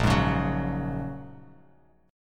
A sharp Minor 7th Sharp 5th A sharp, Bass Standard; strummed; 6,11,11,11